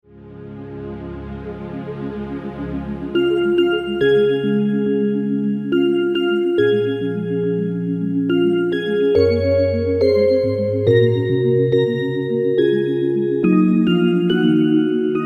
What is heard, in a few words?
lullaby; brahms